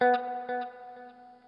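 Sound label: noodle short, guitar; Quantum Leap; 90's Sample CDs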